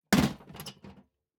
Setting Down Metal Tackle Box From Metal Bucket, Fishing Tackle Box; Badlands Sound